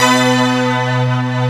HIT B3; East West - Global Sounds; PPGWAVE22

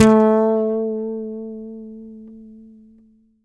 SUSFA3, Bass, YAMAHA5 MUTE

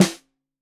Snare 65 Reg Stereo, Deeply Sampled Drums

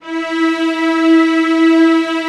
VIOLAS F#4, Strings; ILIO; Synclavier Strings

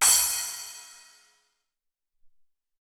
Splasher, Alesis SR-18

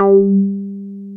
SH101; OLD ROLNDS

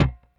Rickenbacker Bass; Airwindows; Rick-Xtras

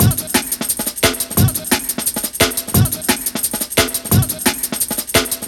A, 175, classic dnb breaks; Think-2

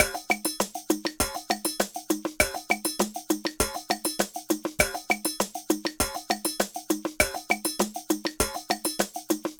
Ensemble, Latin Percussion

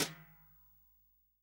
SD, REAL DRUMS, SWING KIT

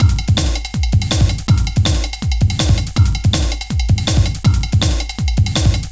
Rat, Jungle Breaks; Jungle Warfare 1&2

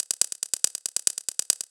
Percussive, Drums & Percussion, Beat Components; Electrocution

